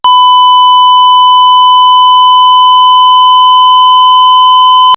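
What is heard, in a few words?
square wave 1khz test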